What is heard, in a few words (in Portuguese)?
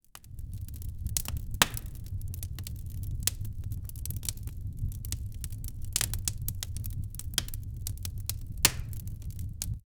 lareira crepitante, fireplace